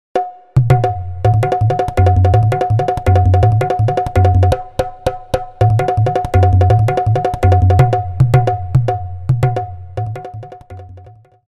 dholak

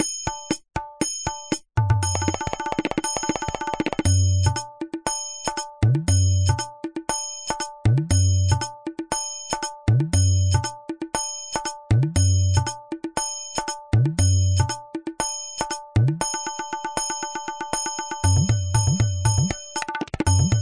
GHAZAL, grooves

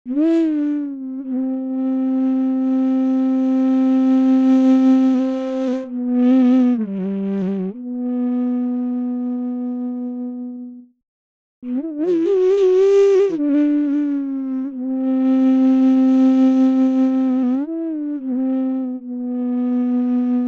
bansuri devgandhar

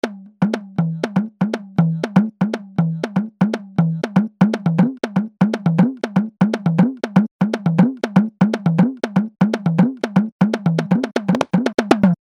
daphli

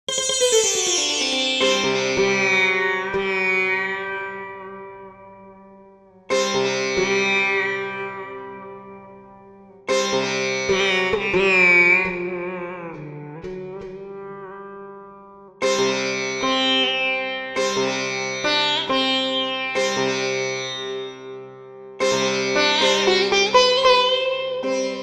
sitar shuddhasarang

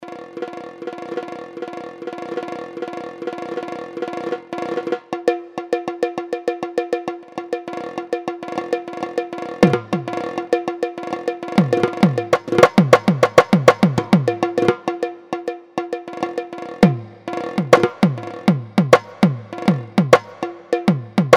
bihu dhol

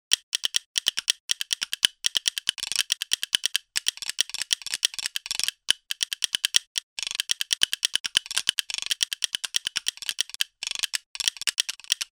khartaal

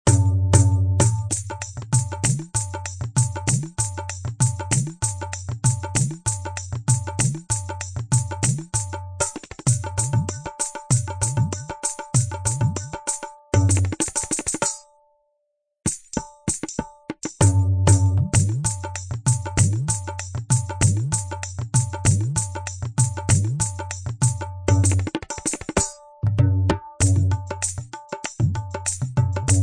qawwali, groove

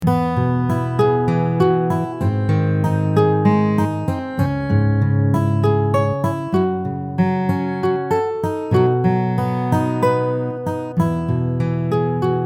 guitar

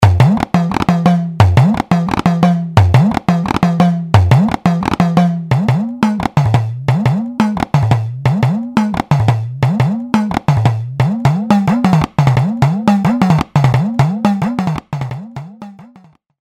udukke

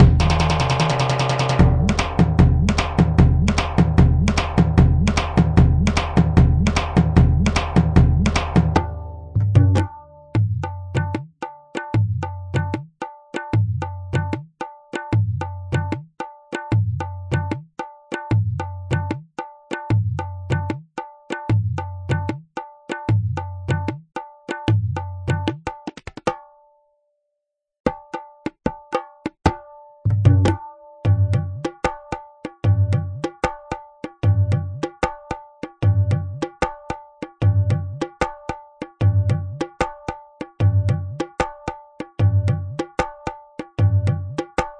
maharashtrian, groove

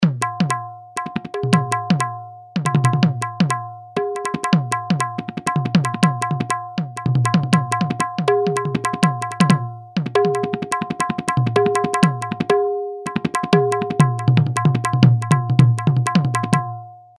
pung

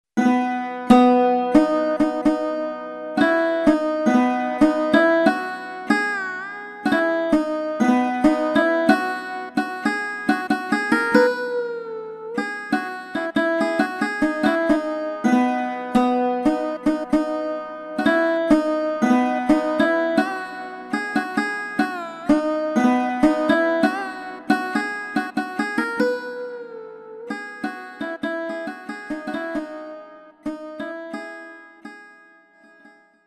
sarod

